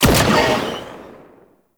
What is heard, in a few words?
Energy Rifle Split Shot; Warframe; Venus; Venus Grenadier Spaceman Agent